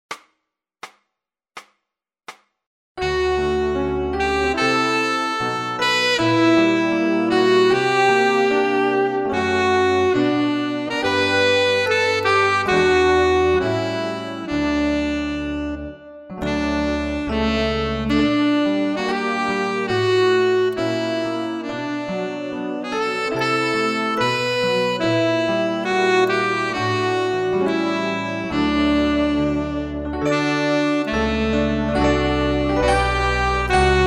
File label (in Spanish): D saxo